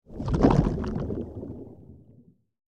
Heavy Underwater Exh, bubbles

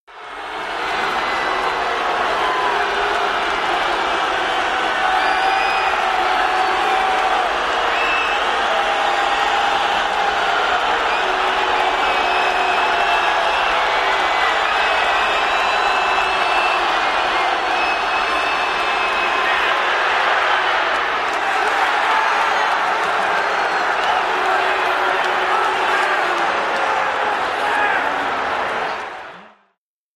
Football Crowd Stea, sports arena football

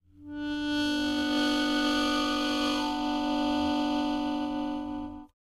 Mouth Organ Soft Fina, harmonica; woodwinds

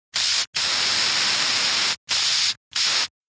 Aerosol spray various, aerosol can; FOLEY PROPS AEROSOL CAN: INT: Aerosol spray various.

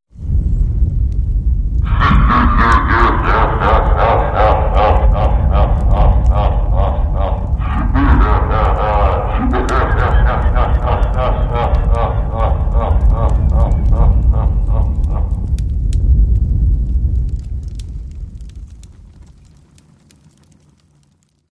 Low Male Wicked Laugh, horror

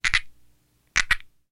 Frog California Tree

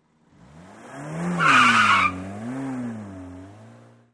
Car Peel Out Small Car

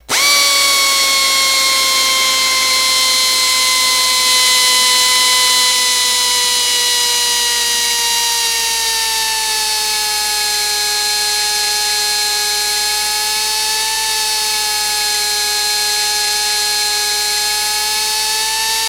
Saw, Air Tool, Epidermal, Bone or Skin, Mono; hospital & lab equip

